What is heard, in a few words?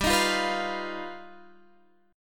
G Augmented 7th G down Guitar Drop D